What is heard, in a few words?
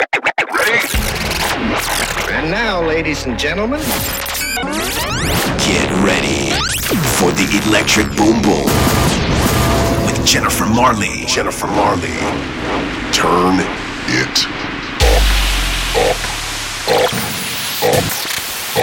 techno